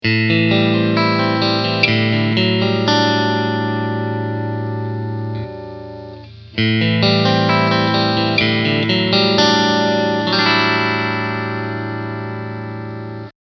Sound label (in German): Front und Middle SC Clean